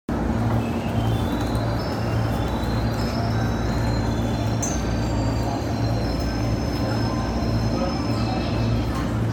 京浜東北線上中里南, train sounds

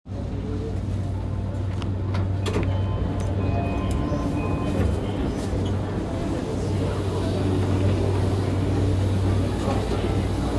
JR 山手線 恵比寿 CW, train sounds

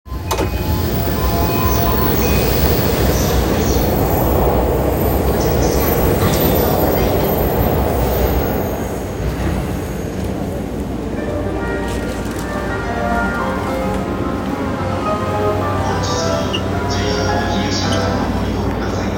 JR山手線新橋CCW

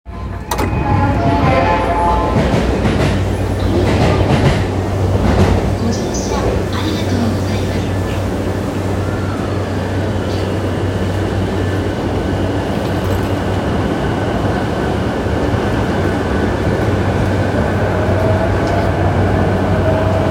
JR山手線東京CCW, train sounds

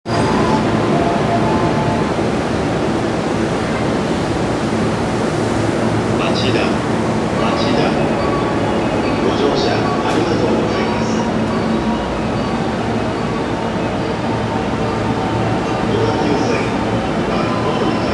JR 横浜線 町田 北, train sounds

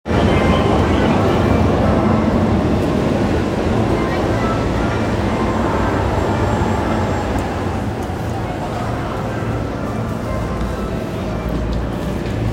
湘南新宿ライン 渋谷 南, train sounds

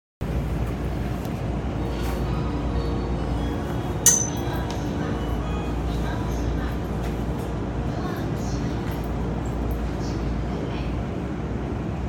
JR 常磐線 三河島 南, train sounds

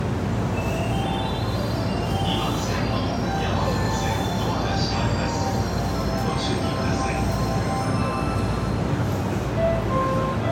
京浜東北線御徒町南, train sounds

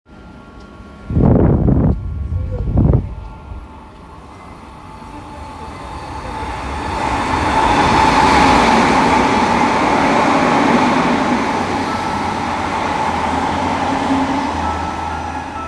小田急線千歳船橋西接近

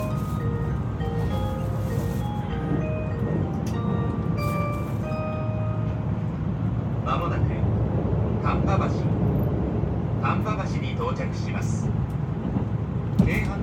近鉄京都線丹波橋南, train sounds

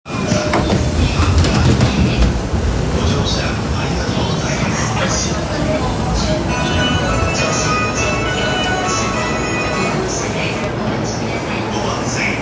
中央線快速神田東, train sounds

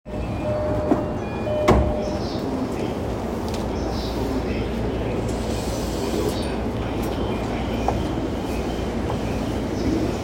JR中央線快速武蔵小金井西, train sounds

